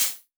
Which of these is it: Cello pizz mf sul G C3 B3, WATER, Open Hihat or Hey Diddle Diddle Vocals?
Open Hihat